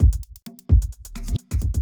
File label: electro kit 130